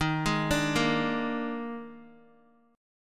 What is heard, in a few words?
Eb Major 7th Suspended 4th Eb, Bass Standard; arpeggiated; EbM7sus4